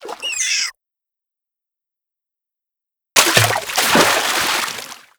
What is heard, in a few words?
Turtle Sea Action; Seer; GamigoNA